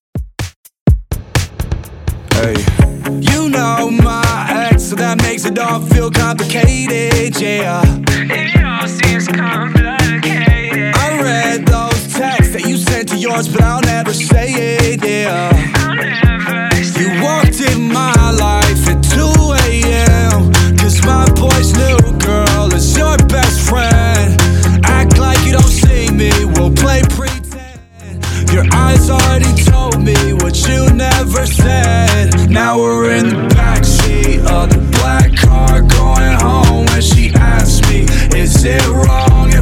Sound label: Top40 Redrum 125bpm